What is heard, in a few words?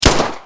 Deagle